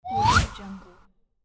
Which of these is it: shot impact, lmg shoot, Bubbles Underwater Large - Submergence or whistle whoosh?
whistle whoosh